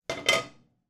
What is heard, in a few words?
household wok put down on stove